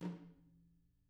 TAMA TOM HIT; ILIO; Double Platinum Drums 1

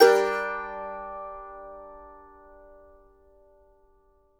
mando min; Sampleheads; Whole Lotta Country 1 & 2

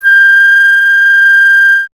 flute G; Miroslav Vitous - Solo Instruments VOL-2; Solo lnst. II

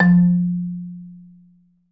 MSOFT F2, Marimba; Sampleheads - Dave Samuels Marimba & Vibes

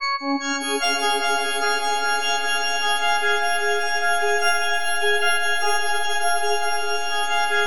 FM C# MAJ; Granular; CHORDAL; Bolder Sounds; OST

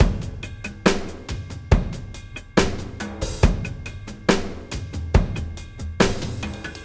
SloMo Beats, Loops 140 BPM

